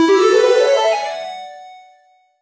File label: level up game rewarding, achievement